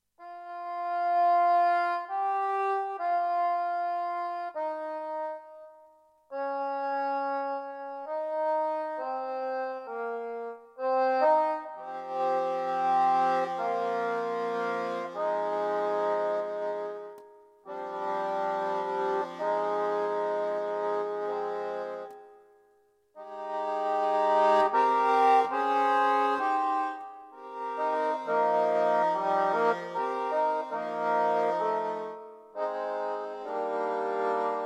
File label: accompaniment only; ravel maurice; trois beaux oiseaux paradis